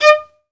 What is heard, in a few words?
Synclavier Strings, SOLO VI; ILIO